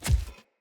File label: Corrupted Battle Dog Walk Step, Foley Footsteps; Animals